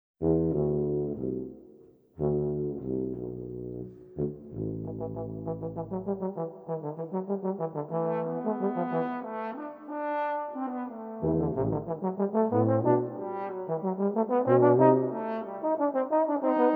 Trio for English horn viola and tuba, Scherzino

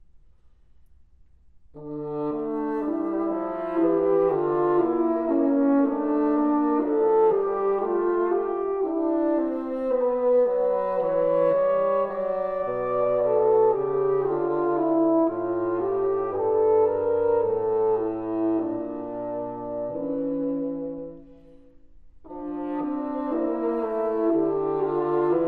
Horn & Bassoon

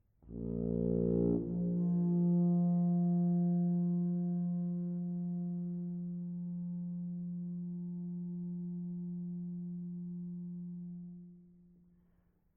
Three Essays For Solo Tuba - Mvt. 3. Postlude

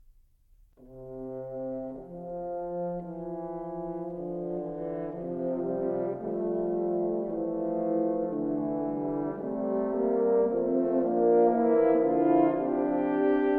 Quartet for Four Horns, Romanza

